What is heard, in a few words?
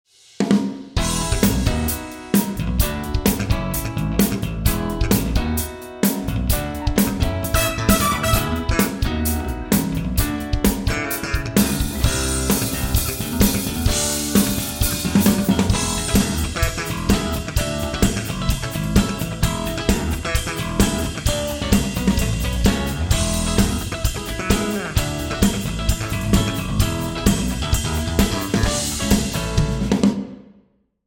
Drums Band, Scoring Stage; 20th Century Fox